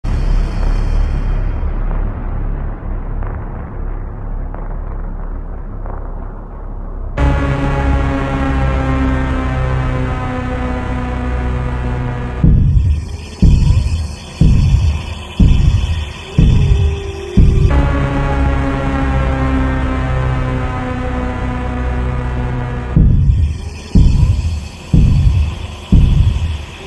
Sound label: slowed and reverb